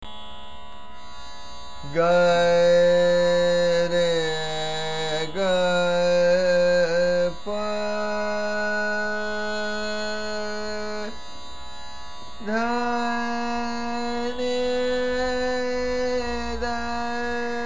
Alhaiya Mukhya Ang

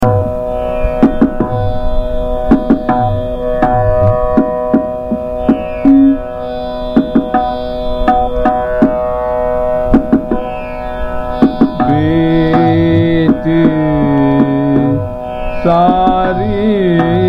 Bada Khyal, Ektal; Durga; Biti Sari Rain

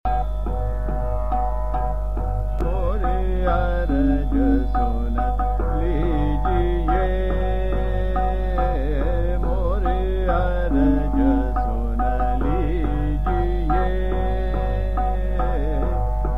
Chota Khyal, Trital; Multani; Mori Araj Sun Lijiye

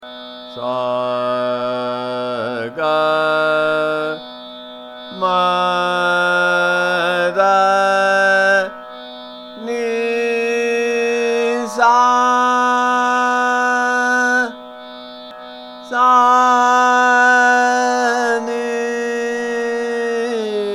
Basant Aaroh Avroh

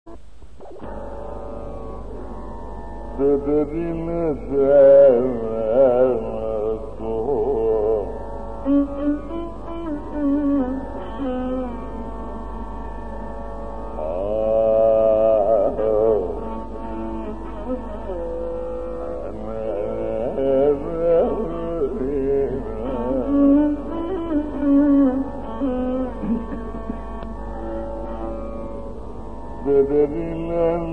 kedaram alapana; Carnatic Manodharma

